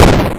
sentry explode

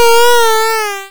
spaceman

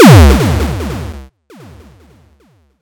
menu select

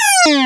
numkey wrong

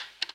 menu switch

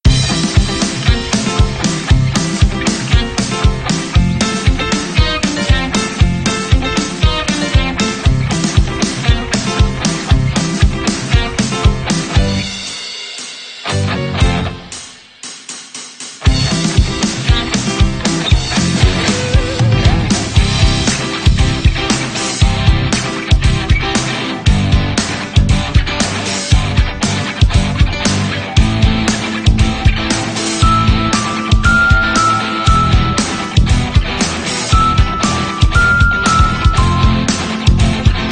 music

